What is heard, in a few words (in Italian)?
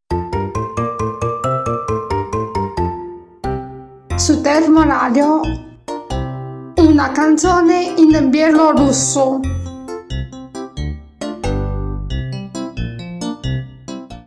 Canzone in bielorusso